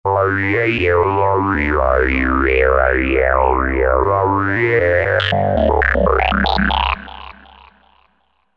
Humanoid, v-synth

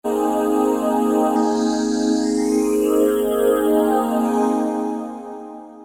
Church Choir; V-Synth

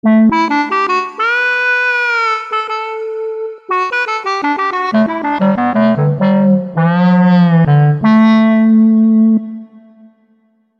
Clarivox; V-Synth

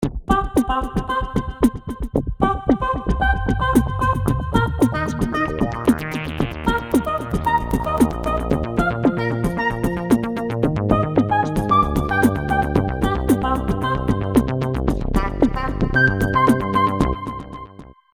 303 Heavenly Note; By using VariPhrase, you can get a sound like this from a realistic voice; V-Synth; V-Synth System Update Version 1.50

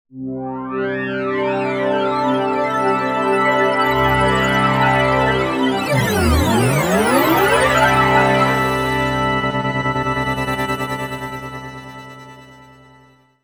SBF Sync Pad, V-Synth

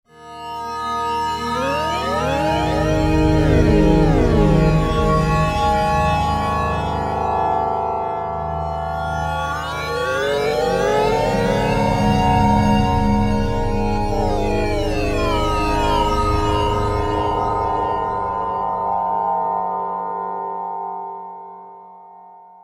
Metal Rolling; V-Synth

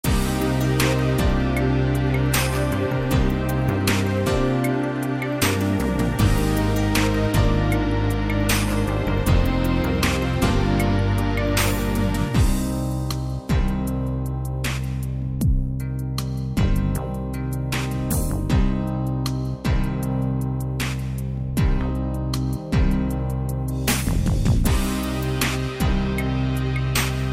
BEAT Cm; gw-7; Pops

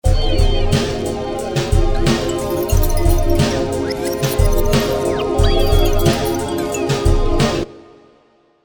Bizarre Loop, V-Synth

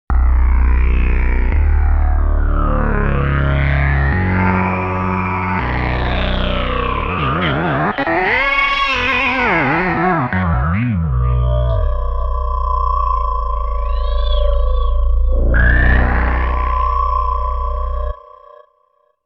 V-Synth; retorospectiv

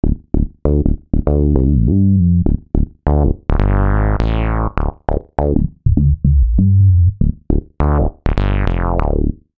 Bendy Mini, V-Synth

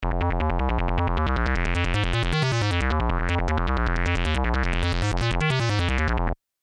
Only the TVF is used in this synth bass.; V-Synth

